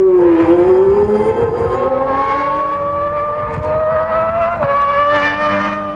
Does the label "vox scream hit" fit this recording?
No